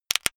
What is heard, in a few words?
plastic pressured single